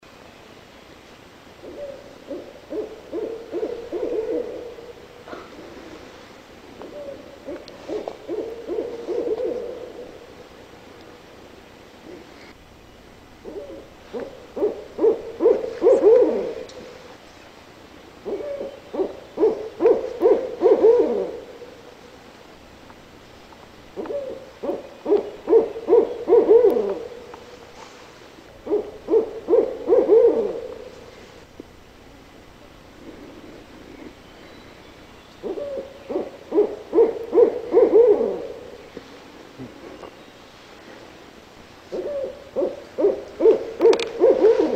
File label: 12 Calls